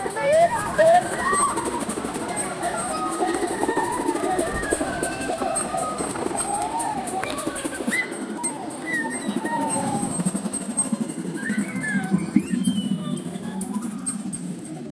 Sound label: playful jungle parade